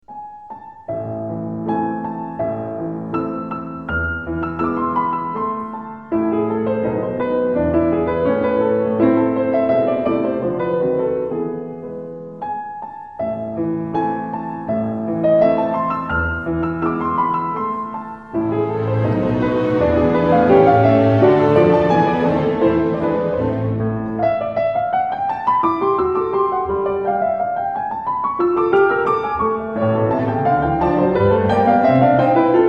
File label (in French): concerto pour piano, rondo; HUMMEL